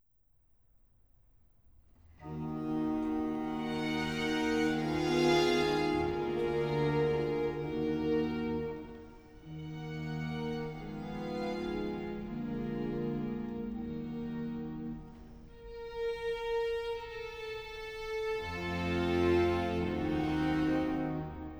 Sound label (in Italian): Sinfonia; Cantata, BWV 4 'Christ lag in Todesbanden'; Bach; Eisenach; Easter Sun & Mon